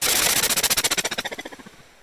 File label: StarShip Sounds